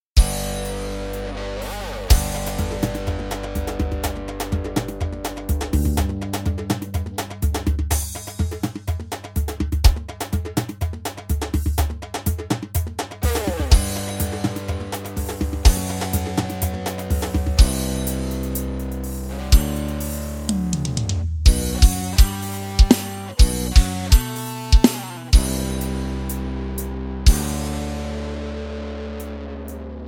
instrumental elétrico

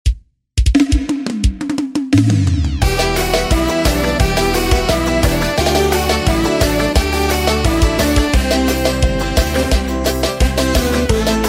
piseiro

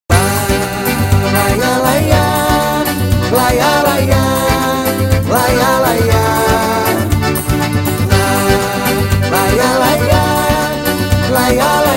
forró

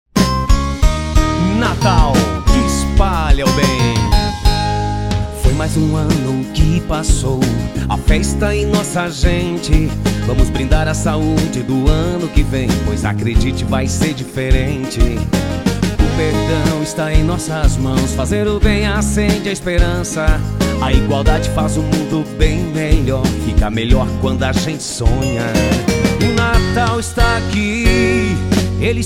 jingle natal; natal que espalha o bem

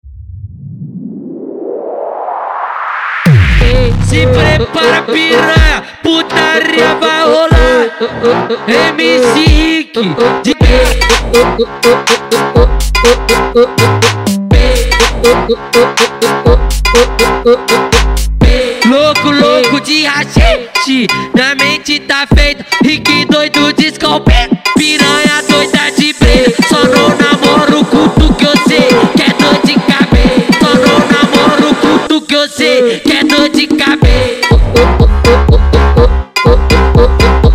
mega funk

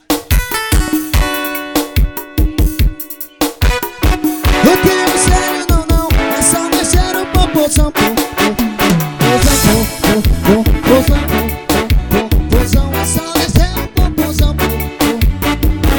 forro

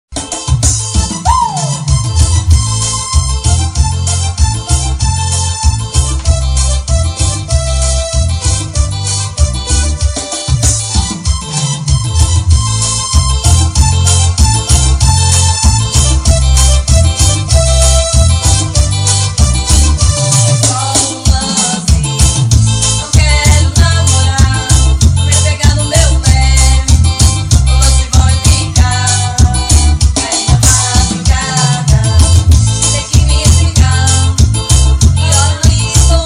forró

